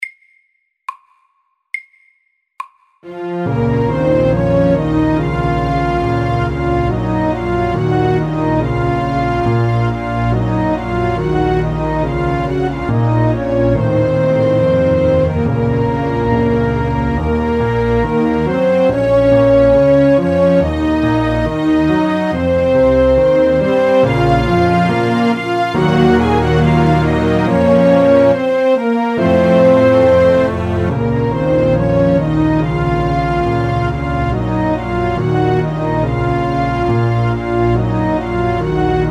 Gammel fransk melodi sakte

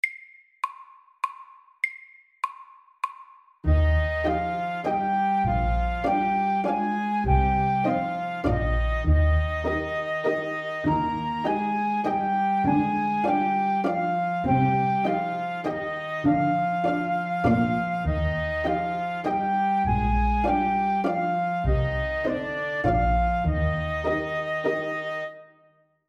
Legato vals MEDIUM 100

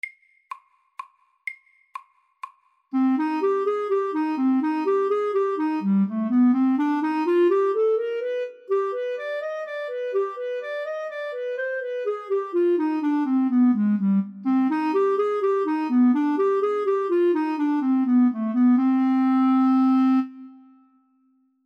D moll etyde FORT

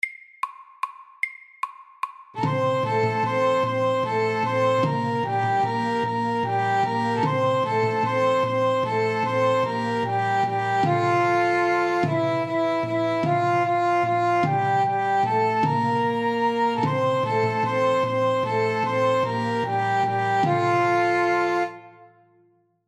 Irsk melodi FORT

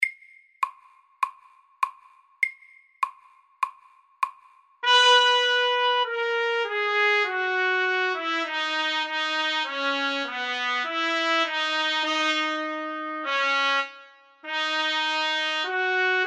Etyde i Dess dur MIDDELS

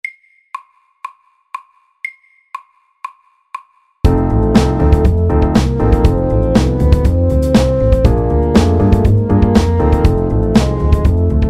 Kromatisk blues FORT

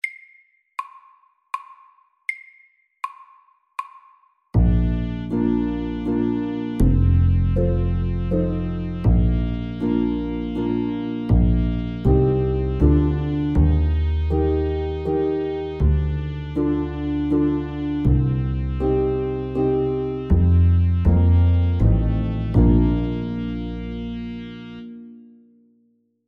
Sommervals, 80

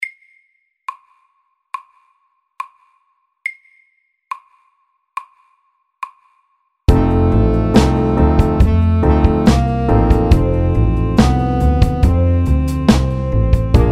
Kromatisk blues SAKTE

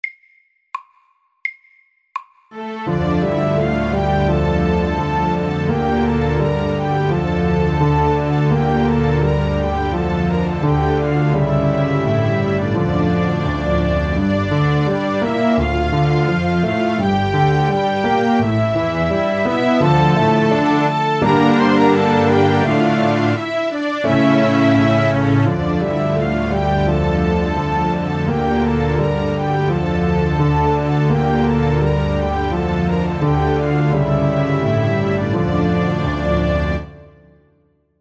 Gammel fransk melodi

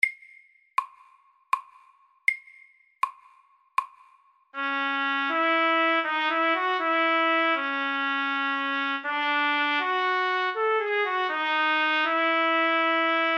Etyde i H dur MIDDELS

